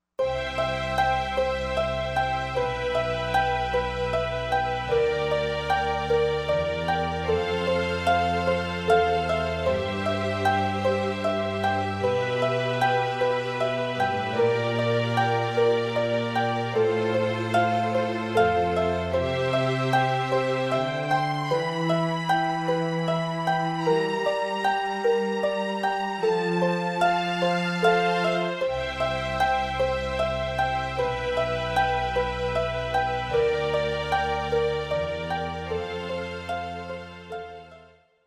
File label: Inspirational Prelude